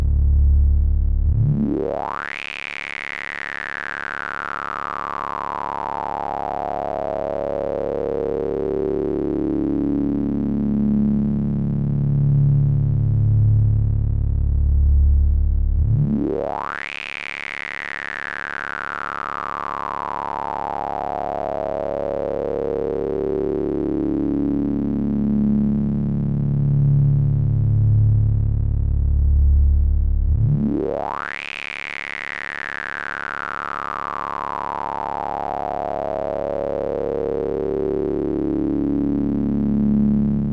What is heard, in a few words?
serge vcfq sweep, synth